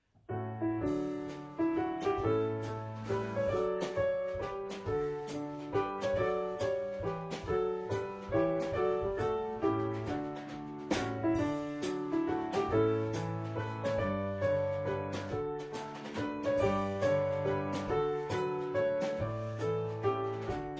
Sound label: piano drums